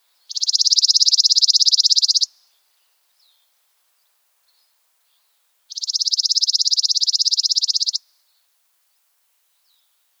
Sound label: Chipping Sparrow